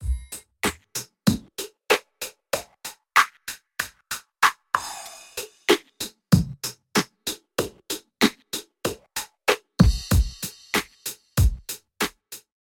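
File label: Vowel Filter; KP3+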